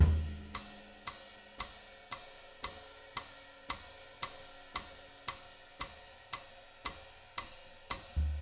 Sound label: 114 CHA, CHACHA, Living Drums; Sampleheads; Peter Erskine; JZ114 CHACHA